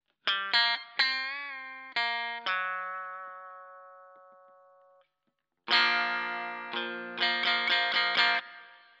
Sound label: bridge ph neck ph